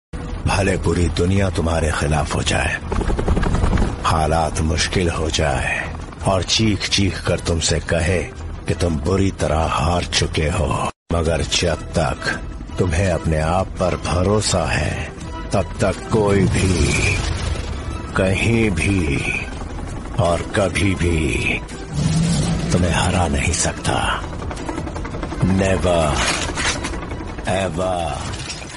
Hindi attitude

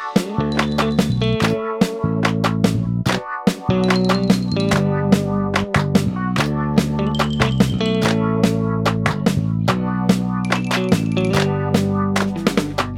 Ringtone; Vivo V19 (Indonesia)

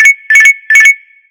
LG Q8 Notification Ringtone

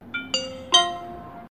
Oppo A96 Notification Ringtone

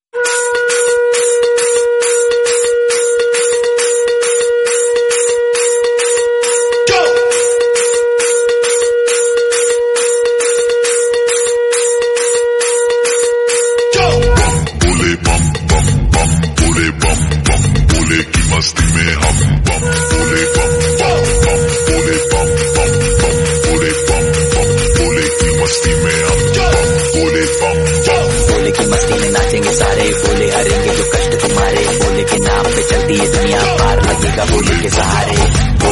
Hindi god Ringtone

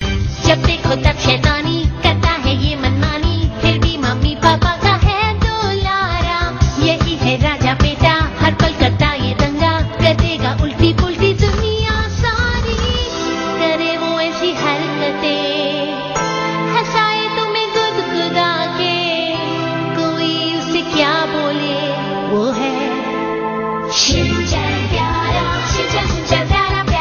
Hindi Ringtone; Sinchan

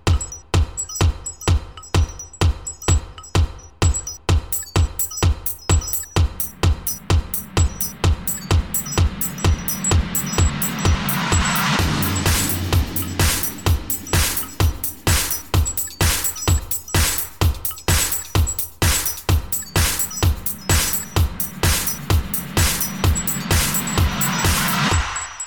Nokia New Latest Ringtone

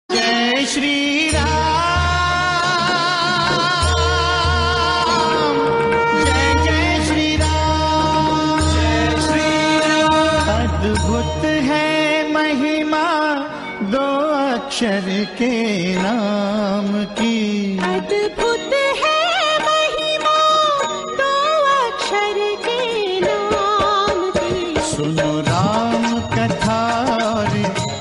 Hindi Ringtone; Ramayan

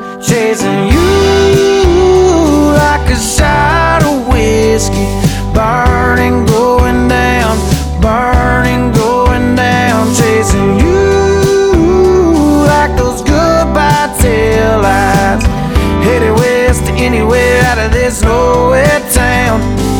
English Song Ringtone

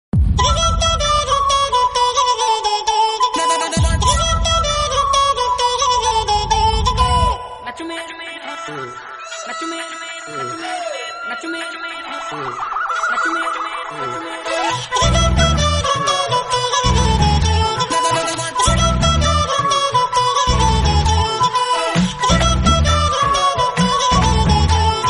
Ringtone Hindi

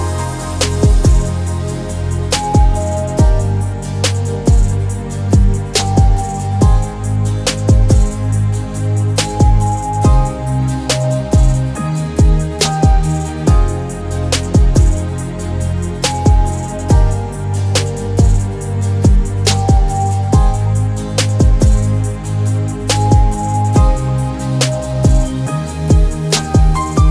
Poco M4 5G Xiaomi ringtone; (India)

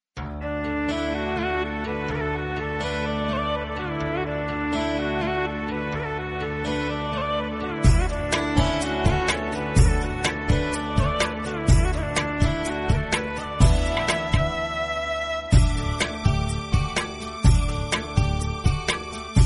Hindi Music Ringtone

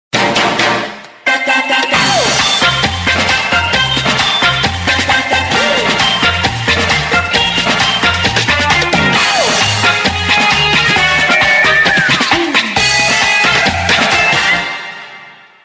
Samsung ringtone

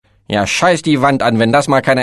German Sms Ringtone

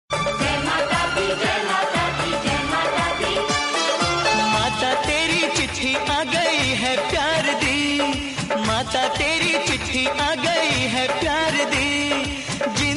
Hindi Bhakti

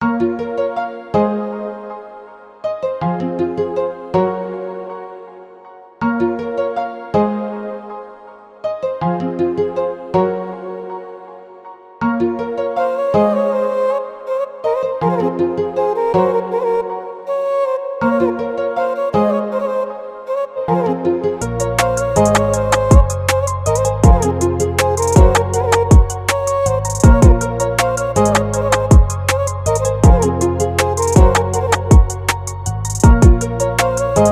German Rap Beat; Zürich; English Ringtone

